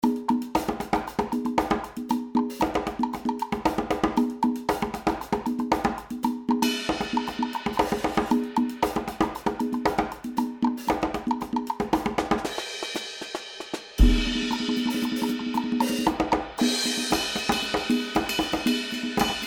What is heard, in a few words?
Funk Rhythm Session Percussion